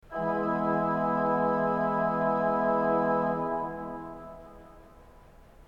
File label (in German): ton, orgel